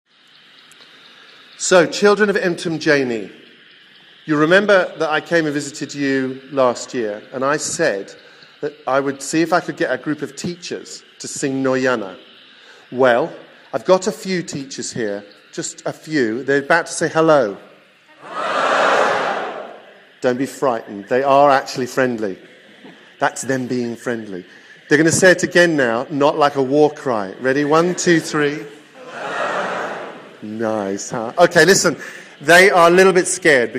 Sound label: singing noyana to children; rog11 conference; in sa